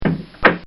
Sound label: Fall And Bang; Injuries; Combat